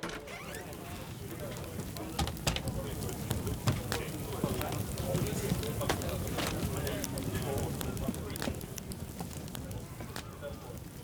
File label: Crowd Ambience; Pitch Black Audio Pong